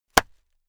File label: plastic bag burst small pad